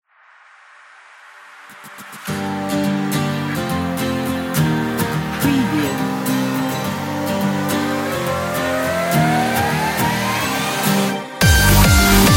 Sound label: dubstep logo ident